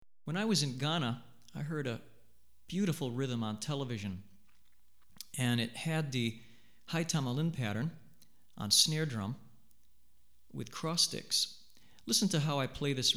Sikyi, Drumset Example, West African Rhythms For Drumset; Hartigan